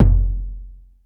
hard drums; 90 sSampleCDs; Crypton - DrumBase5000

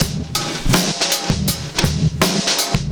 Rattle Loop, Drum Loops Compressed; Zero-G Smoke

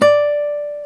GTR Nylon, Nylon Martin; Club-50 - Foundations Roland

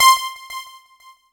C5, synth line; 90 s Sample CDs; NiceBeats; SynthLine VOL-3

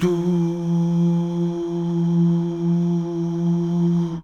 DUUH

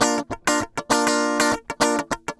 100 BPM

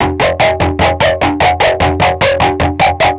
Overtone, Industrial, Nasty

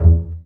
BA PIZZ E1, Orchestra; Best Service; Peter Siedlaczek's Advanced Orchestra

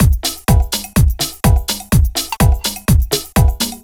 A Slice Of Pad, Drum Loops, 125 BPM; Sonic Foundry (Sony Creative Software); Underground UK House & Electro

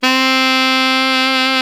SAX ALTO FF, Alto Tube; Roland LCDP07 Super Sax

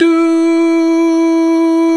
VOX, Male Scats

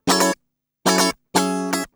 GUI 120 5aF#; Best Service Gigapack 2

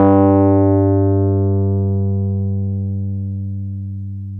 PNO, Roland Keys of the 60s and 70s, Wurly

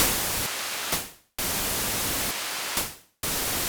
Gated Seq Noise, Gate Filtered 130BPM

